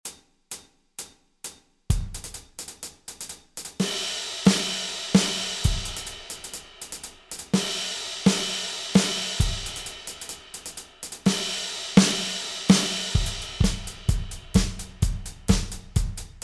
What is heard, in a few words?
128 bpm drum track, Played on Yamaha FGDP-30; through Apple Brooklyn sound set; A cheerful and optimistic mood